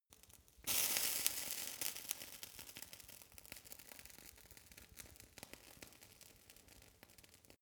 fire torch sizzle phase

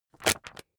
magazine skim bright

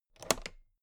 toolbox latch close regular

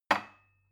glass put down cupboard

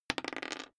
die roll table long

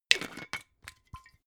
glass bottle massive close

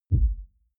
sub bass

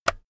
keyboard external key release

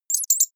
bat squeak

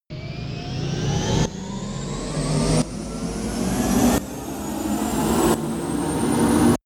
turbo lift off

